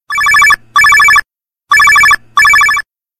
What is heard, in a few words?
Motorola, mobile ring